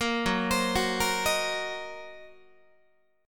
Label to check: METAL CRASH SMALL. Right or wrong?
Wrong